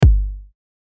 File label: Kick Drums; کیک درام